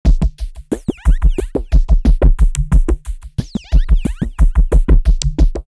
90, drumloops, DrumMachines; X-Static Goldmine

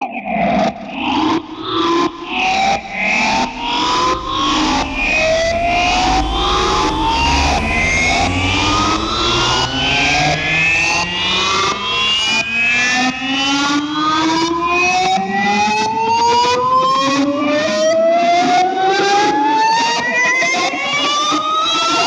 Rises; neuro; Optiv & BTK

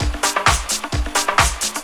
drum 130 tribal, drumloops; Techno Trance Essentials

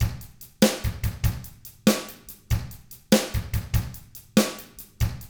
ROCK 6/8, Drums; ILIO; Double Platinum Drums 1

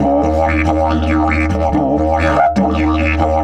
EF 140, DIDGE RIFFS

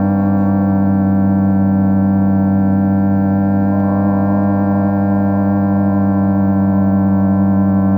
Funkdroner; Sonic Foundry (Sony Creative Software); Synchro Funk

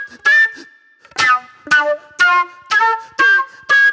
Jammy Flute

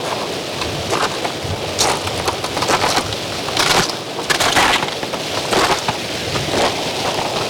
Ice Steps, On The Glacier

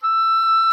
OBOE E6, REEDS; Greytsounds VOL-1

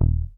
P BASS MUTES D#1

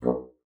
bassoon, B1; Xsample VOL-6

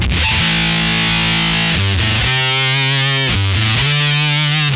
RIFFF, Funk Guitar; 90 s Sample CDs; Zero G

